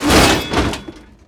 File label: Coffin Metal Emerge; SFX Kit; Foley - Misc